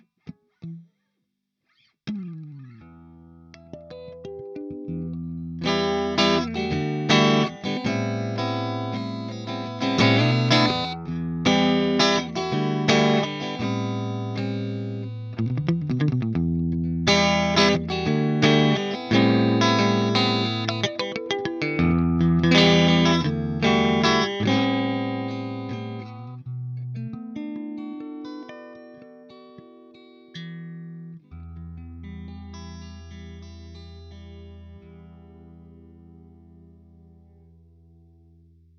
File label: duosonic; squier classic vibes